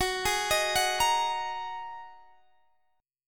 A sharp 7th Suspended 4th Sharp 5th F sharp, guitar